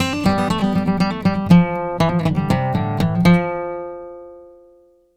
Acoustic Guitar Lead, Ukraine Gypsy; Sonic Foundry (Sony Creative Software); Continental Drift Aftershocks

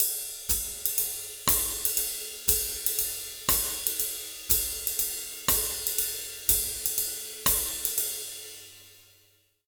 120 BBAND, Jazz Latin Drumloops; USB Soundscan vol.08; AKAI

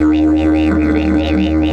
140, DIDGE RIFFS; Big Fish Audio - Didgeridoo and Other Primitive Instruments

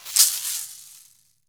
caxixi; Brazil

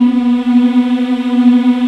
African Mm; Roland LCDP11 Africa VOL-1

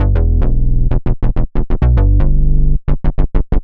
Bass 132 E, uk garage

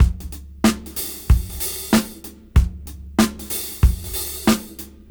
Funk 94 BPM; AMG - Keith LeBlanc Phat Butt Jams

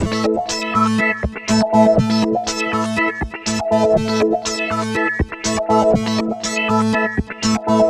NRG Arp, trance

